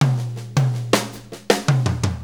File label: Blues Fill; Sonic Foundry (Sony Creative Software); Drum Tools